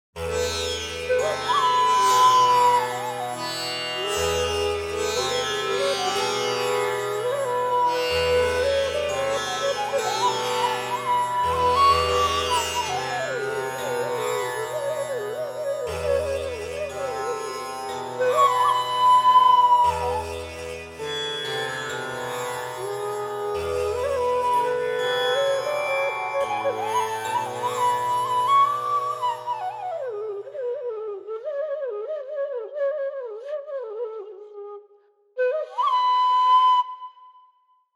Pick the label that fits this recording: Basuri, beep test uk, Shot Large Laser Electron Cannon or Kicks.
Basuri